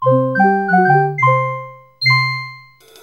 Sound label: Tightrope Tension - Failure; Wii Fit